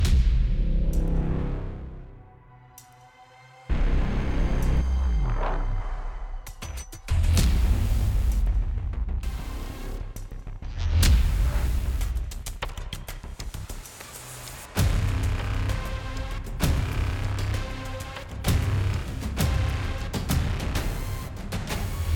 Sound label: Mood Tension Heavy